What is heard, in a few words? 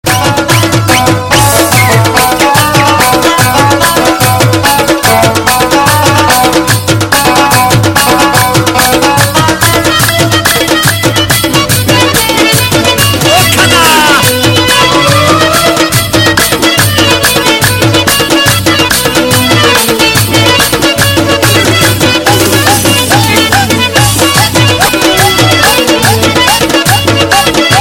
pashto ring tone